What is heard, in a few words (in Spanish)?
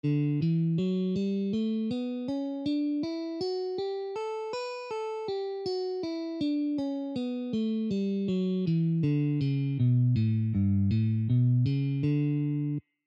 escala de Re mayor posición 5